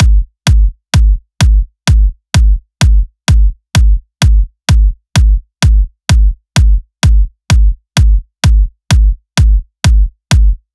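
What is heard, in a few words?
128BPM Bass Drum; Essential Clubsounds Vol.5; Break the Chains; Root G#min; Drums & Perc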